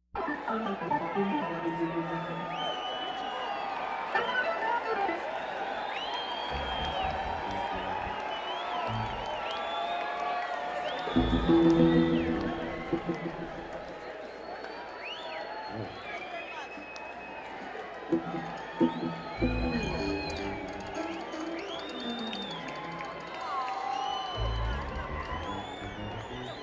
Crowd

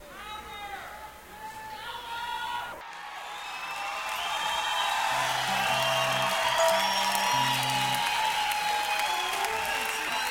Crowd

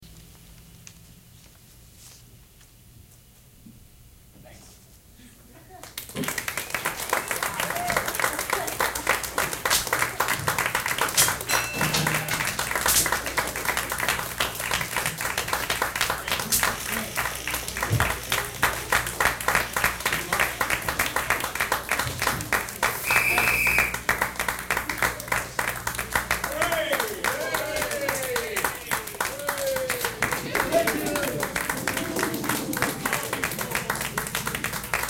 audience; ICA Boston

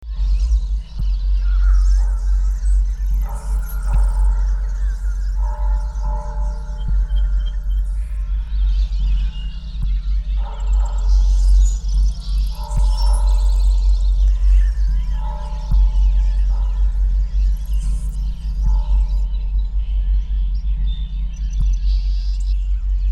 Distort Overdriven; Aairria

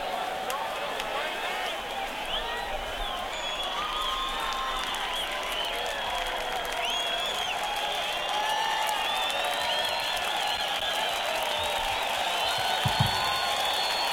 Crowd

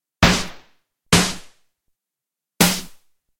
Tube Snare; Kawai K1; Bank 2 card A

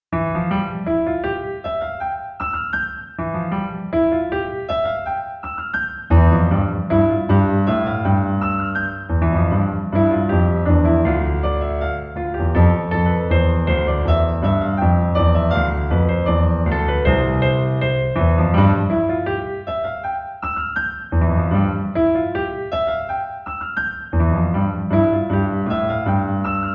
Piano

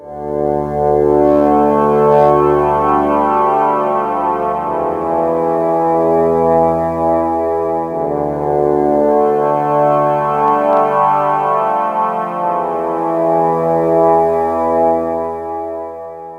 space oboes; Kawai K1; Bank 2 card A

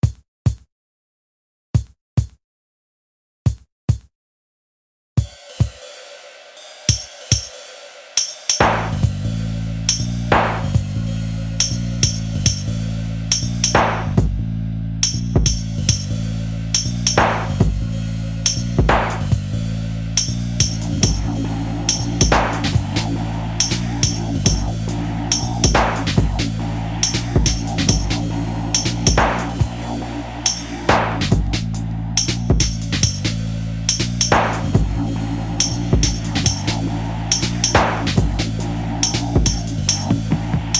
Rythmic

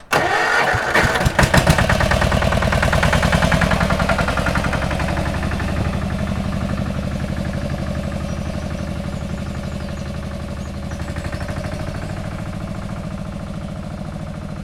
Tractor; Mapa Sonoro De Ibias; Tractor de Amador

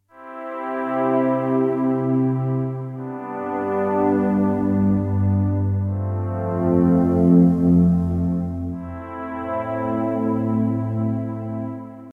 Shadow Pad; Kawai K1; Bank 2 card A

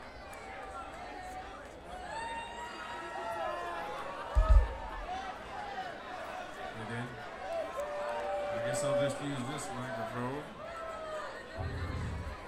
dead air harmonica levels

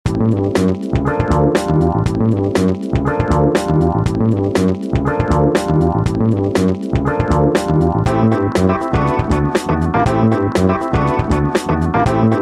Vinyl Blues Intro

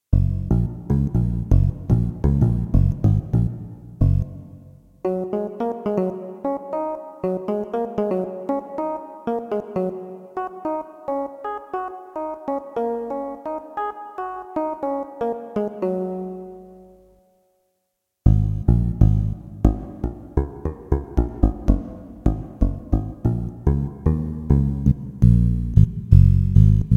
Robot Bass; Kawai K1; Bank 2 card A

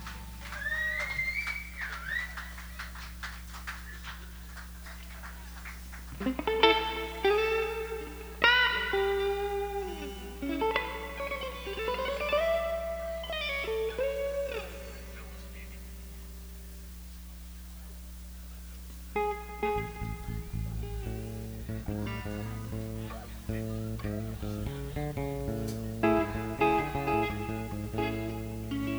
tuning

